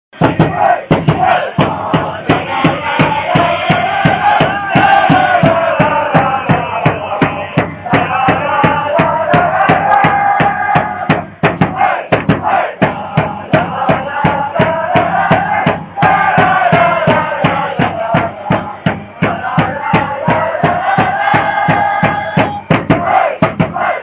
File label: doremifa